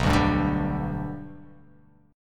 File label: B Minor Sharp 5th